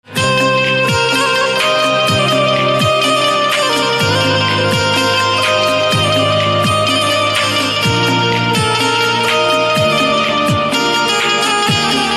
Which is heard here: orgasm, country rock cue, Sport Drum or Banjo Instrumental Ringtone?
Banjo Instrumental Ringtone